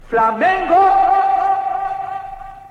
grito; flamengo

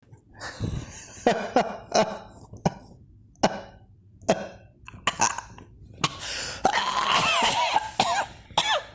risada; do coringa

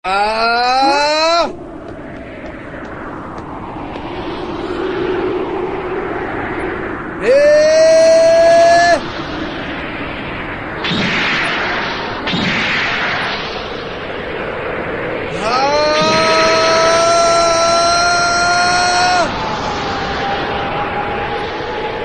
melhor grito de kamehameha; dbz

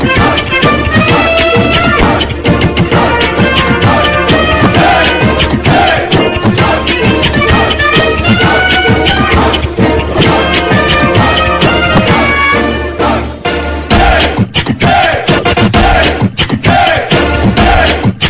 hino nacional do brasil funk remix

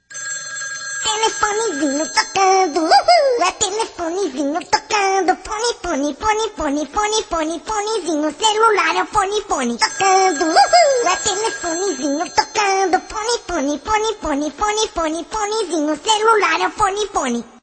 toques para celular telefonizinho tocando

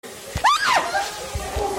gritando

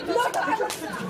claque

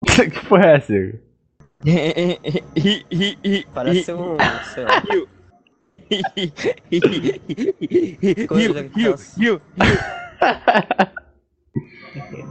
rindo de vdd

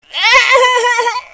risada marijuana

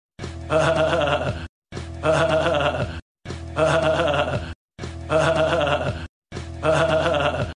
a risada do denver; de la casa de papel

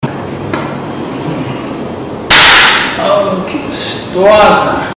tapa

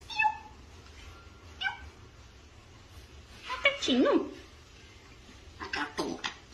papagaio gatinho

